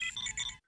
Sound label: Beep (Close Window); Warzone